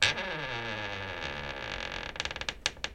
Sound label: creaky door hinge